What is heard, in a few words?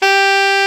SAX A. FF G, Alto Short; Roland LCDP07 Super Sax